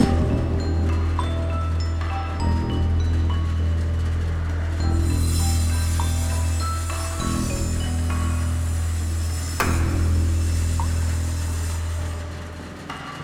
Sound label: ambiance